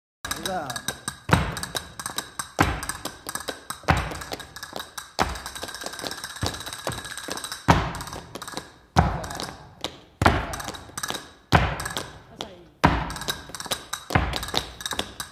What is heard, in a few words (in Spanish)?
Instrumentos castanuelas cajoun balalaic whistle